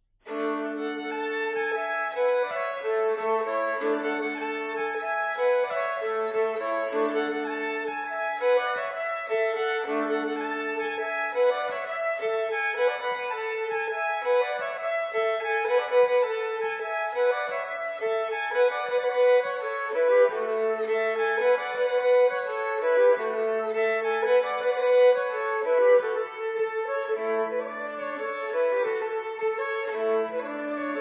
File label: Springar Fiddle; Jon Vestafes; grieg